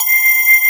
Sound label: METAL BAZZ